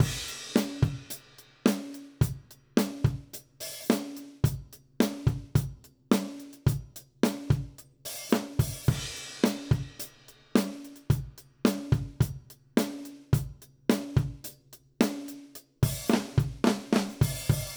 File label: Country Drums (Chorus 3); Digidesign; Pro Tools Essential Loops